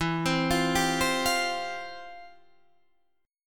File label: C Suspended 4th E; Csus4/E